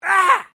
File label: MALE OUCH; Male Vocal sounds; Pain; Voice